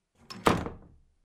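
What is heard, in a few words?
door slam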